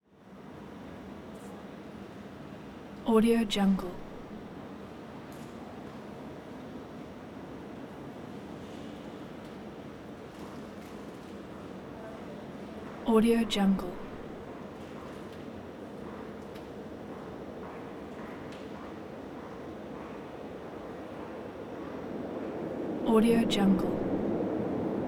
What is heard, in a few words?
railway ambience railway station approach; muni; prosoundfx